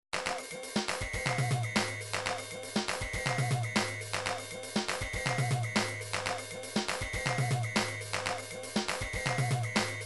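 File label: drums pattern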